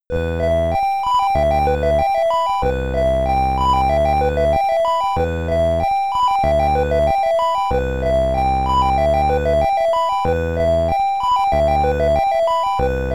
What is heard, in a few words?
Checkpoint (SID Stereo); Paranoia Complex; Commodore 64